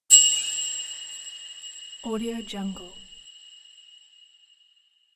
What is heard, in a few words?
metal effect